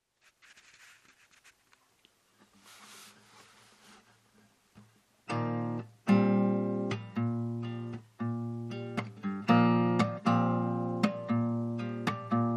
Urban Groove

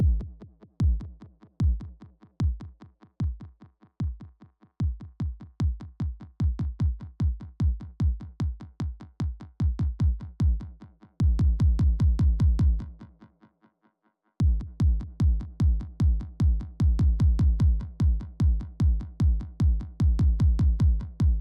kick

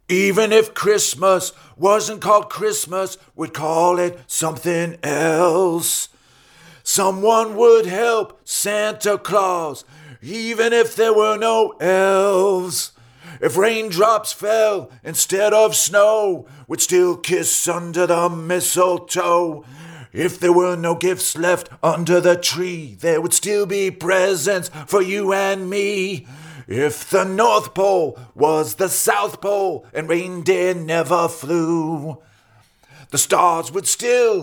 Vocals

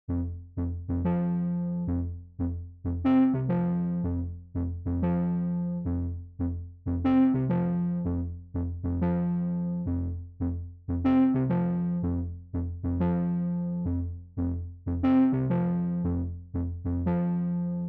F 120 Impro bass riff

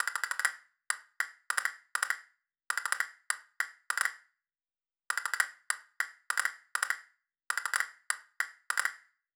Castinets

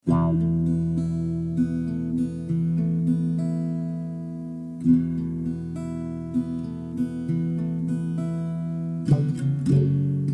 E 100 Acoustic GTR arp

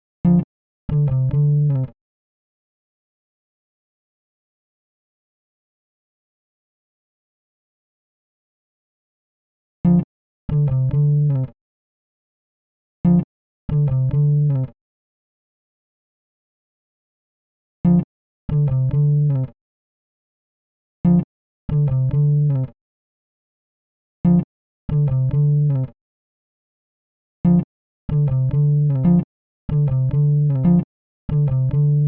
mag Bass

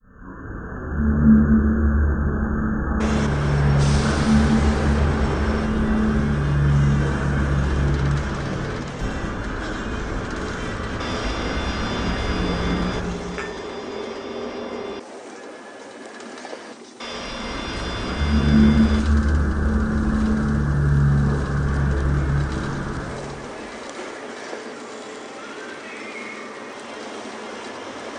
Soundscape

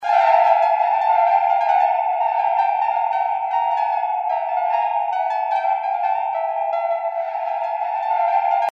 Animated strings

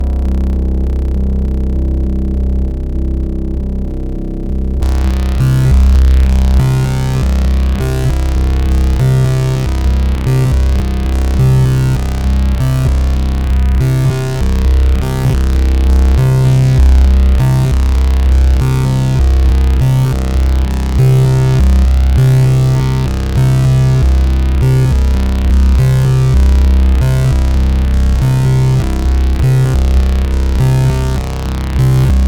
d100 bass pulse; hetzdorf

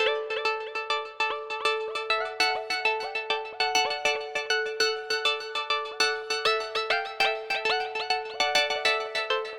synth Liquid Keys 100bpm G

